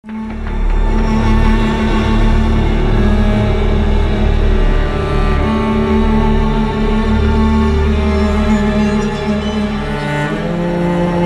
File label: Temple Cello; Myth III - The Wolf Age